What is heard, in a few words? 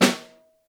SN1 Room, Snare; Ableton Foundation FreePacks; Snare Designer